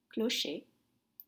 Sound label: clocher bell tower